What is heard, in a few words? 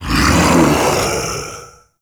Male Vocal Breath Heavy; Enemy; Mockeries; Sub Boss; Mockery